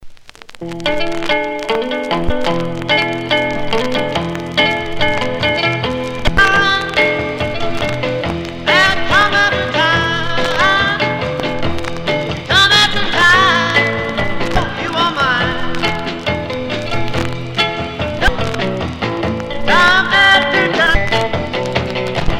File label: Back Alley Blues